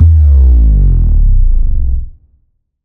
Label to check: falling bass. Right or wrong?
Right